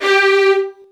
Virtuoso Strings; ILIO; Kirk Hunter Virtuoso Strings VOL-3; 90 s Sample CDs; LRGE STR HRD

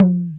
EQ Perc, Percussion Mix

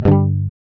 GUI G, Acoustic, Roland

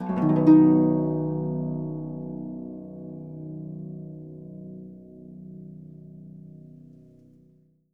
Percussion & Harp; Miroslav Vitous

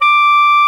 SOP SAX D6; Greytsounds VOL-1

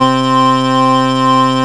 SIEL, String Machines

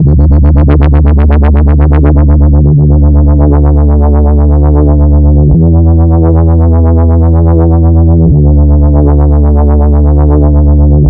Rameo Bass, Drum n Bass; KJ Sawka; Drum n Bass & Beyond

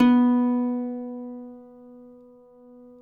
cuatro; Spectrasonics; Hans Zimmer Guitars VOL-2

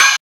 Casio SA-10 Ohh; 300 Drum Machines

